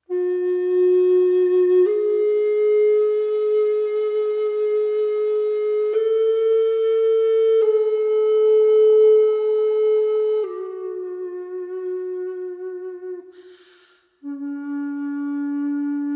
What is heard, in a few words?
windserie Intermission